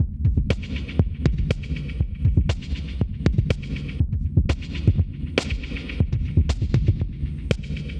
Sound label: Drum loops; Just Add Drums